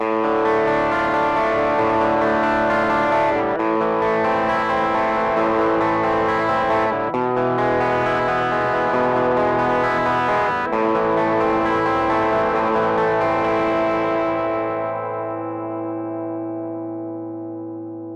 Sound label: PRS Custom SE - TS-808 LoGain AllChord